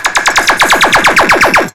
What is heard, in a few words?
zaps, Cartoon; Cartoon - film effects